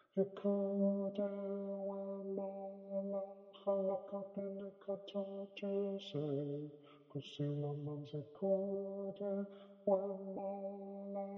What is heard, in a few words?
Alto, choir; Iqude